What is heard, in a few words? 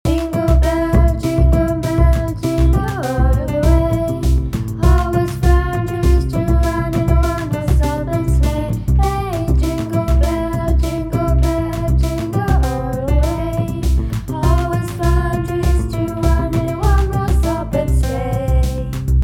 jingle bells